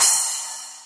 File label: splash, virtual drum sounds